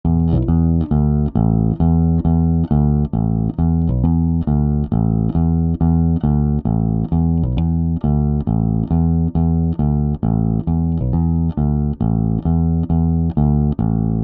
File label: Bass Chorus